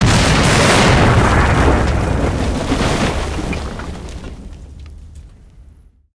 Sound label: building crash